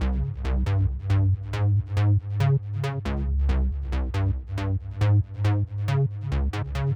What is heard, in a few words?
Techno Bass Cmin; Dance DJ; Digidesign; Pro Tools; Pro Tools Essential Loops